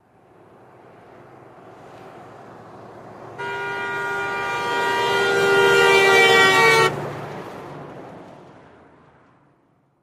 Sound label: Car Doppler Horn By, doppler horn bys